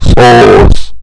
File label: sus sound; SussyCraft; amogus general